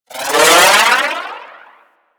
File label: Arch Wing Prime Dodge Roll; Movement; Warframe; Vehicles